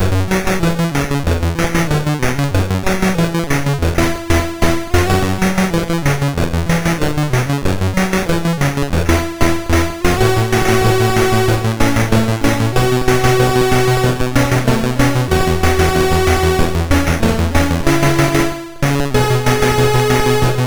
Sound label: Synth Sample III, MOS6581R2